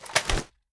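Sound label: foley assault rifle akilo47 raise; weapons; viper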